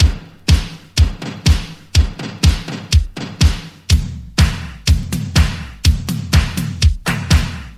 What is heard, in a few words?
123 bpm drum loop d sharp key, kick tuned to the D# note. Loudest frequency: 1307Hz